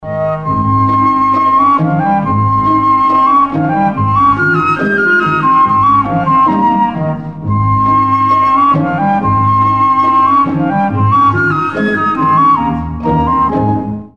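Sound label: beautiful melody; krasivaya melodiya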